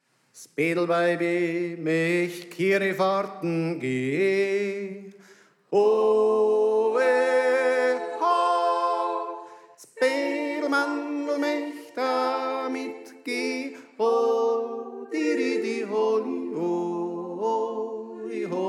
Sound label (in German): Tenor; s Bettlweibe Möcht Kirifahrtn Geh